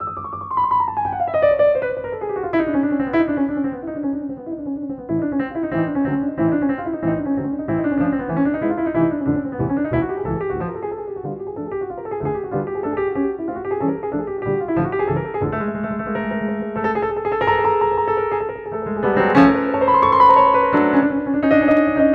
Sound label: Concert Black Grand Delay